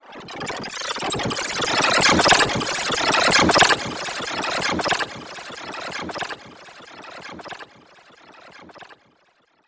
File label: Digital Malfunction